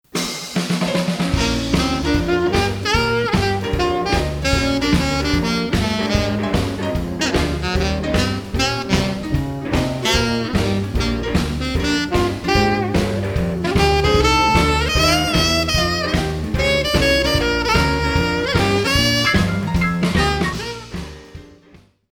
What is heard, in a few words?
alto pentatonic addition, saxophone